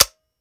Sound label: weap fire first; gmod; viper; weapons; scharlie